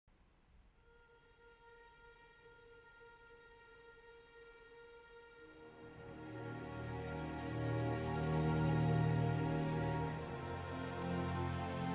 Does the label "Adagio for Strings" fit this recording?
Yes